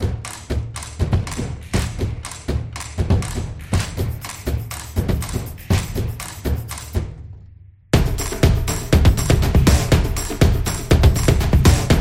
A Percussion Fun; soccer stadium

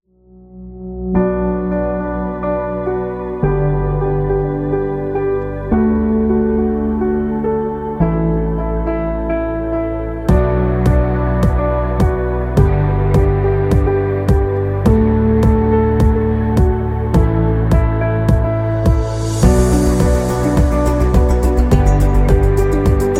Soft Emotional; corporate promo

